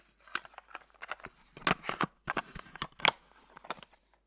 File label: Cassette Box Cassette Case